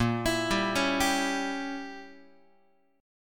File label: A sharp Diminished 7th Guitar, chord; arpeggiated; JGuitar